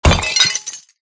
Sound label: Vase Break